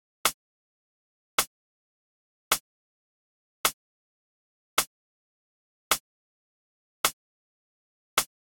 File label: Drum Loop - 106 BPM Rim, Afrobeats; PARADOX II - Drum Loop Collection; Cymatics; Tribute Drum Loop